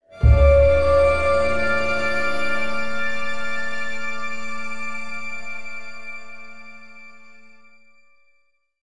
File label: sting Surprise; the walking dead michonne 1 in too deep